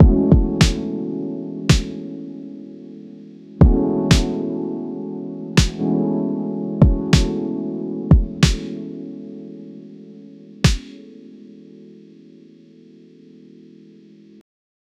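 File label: clear punchy electro r&b, drum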